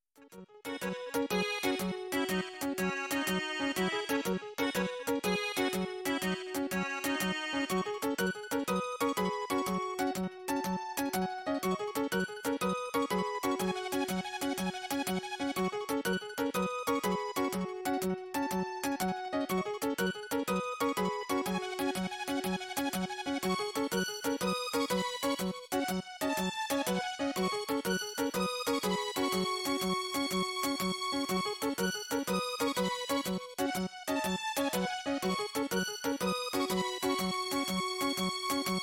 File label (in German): dorf; harvest moon